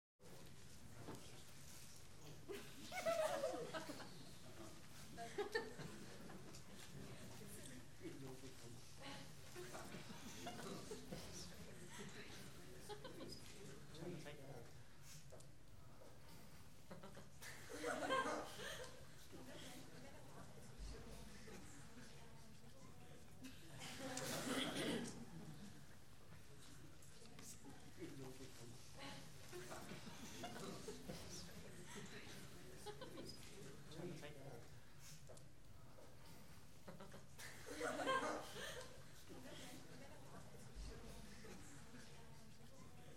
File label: Light Entertainment Audience - Quiet Expectant Audience; Human Crowds, Children & Footsteps